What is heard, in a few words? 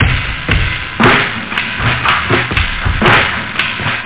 breakbeat